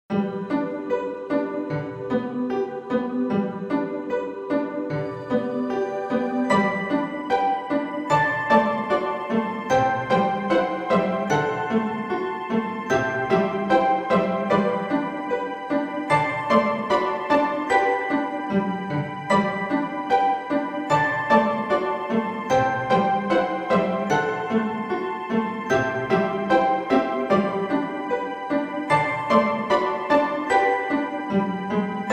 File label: Instrumental